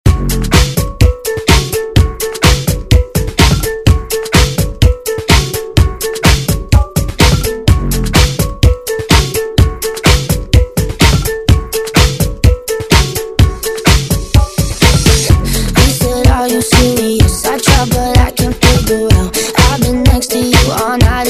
Uptempo; Redrum

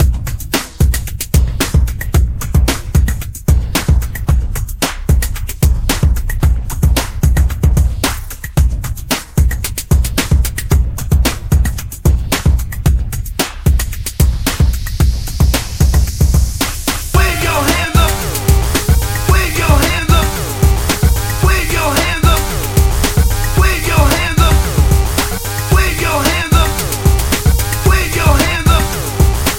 Hip Hop Redrum Hype

